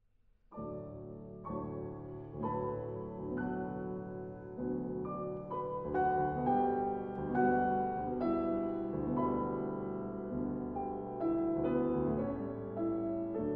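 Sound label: violin sonata; fuchs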